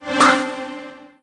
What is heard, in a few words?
Line Blast Ringtone